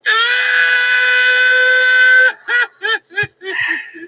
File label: crying; weeping willie